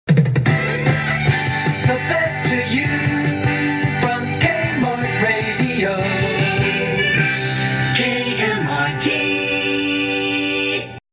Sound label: jingle; kmart